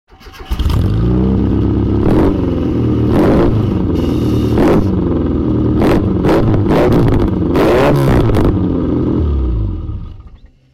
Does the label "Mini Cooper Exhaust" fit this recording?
Yes